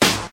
noisy snare sound E key, snare tuned to the E note, Loudest frequency: 3076Hz